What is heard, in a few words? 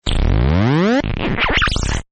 hard start, Button; Buttons; Sound Effects:Multimedia; Partners In Rhyme